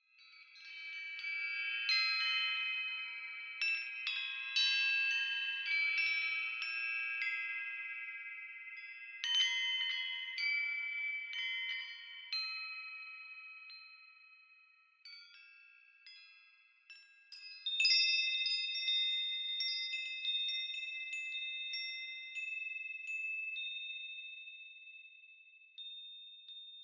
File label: Wind Chimes